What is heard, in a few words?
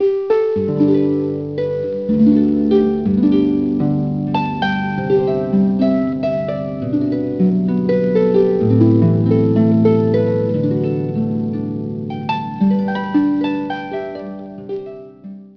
plunk